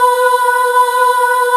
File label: Choir; awe32